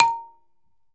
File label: BALAFON